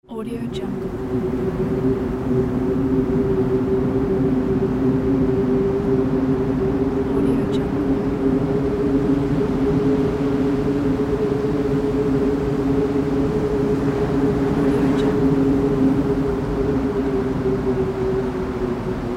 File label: blizzard